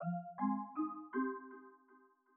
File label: Ring